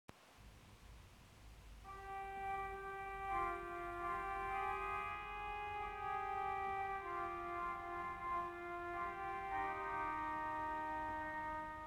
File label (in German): Oboen Reihe 16 8 4 Oboe 8, orgel